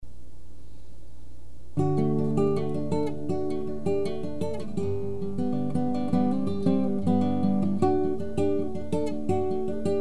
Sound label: guitar